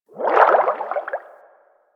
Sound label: Fish Tank Movement Quick; Warframe; Liset; Hub; Personal Quarters